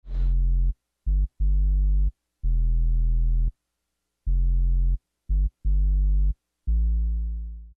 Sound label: sub bass; Hip Hop /R&B instrumental